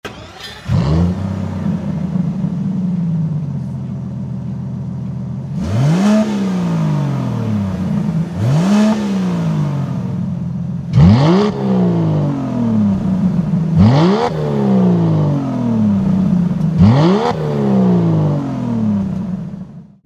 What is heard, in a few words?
vanquish downpipes audio